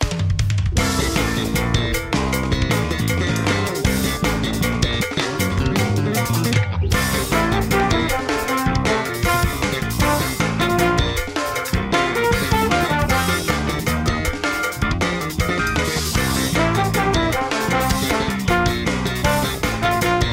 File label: Music Underground; MK8; SuperBellSubway